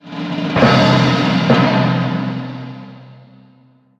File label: Item Unlocked; madworld